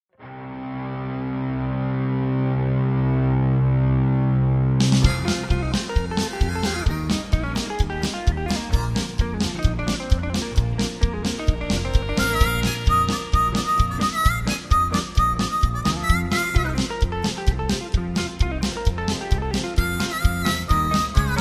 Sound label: Instrumental